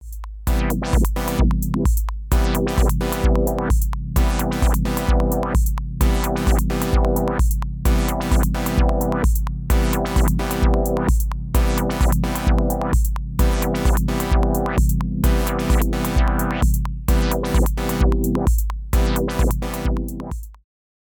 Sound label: Juno6 Filter Pad; Rossum EM Control Forge